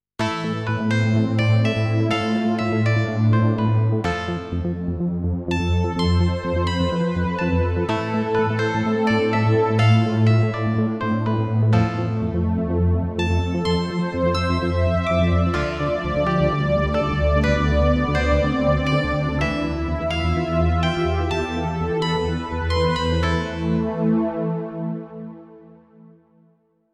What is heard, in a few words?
Blue Dream